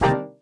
chord C, Chord Shots, Chicago House; Classic Chicago House

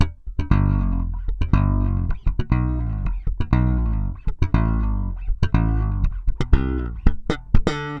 SM BS G, BASS SAMBA

